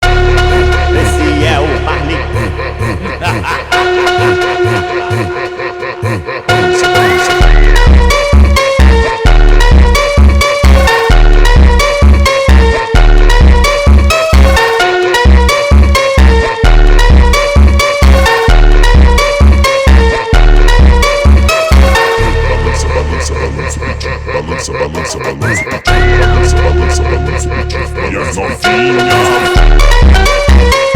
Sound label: funk de balana brazilian phonk